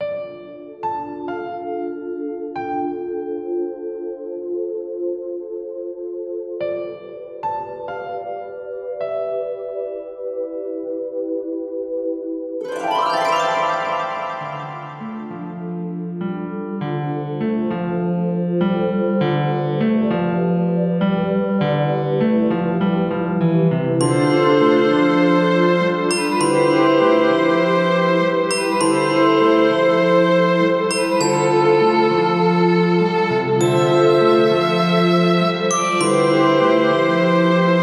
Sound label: creeping forest stream